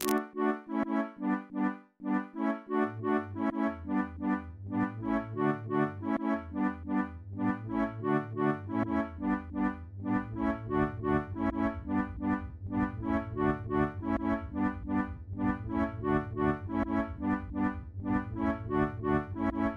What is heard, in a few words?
Alien Pop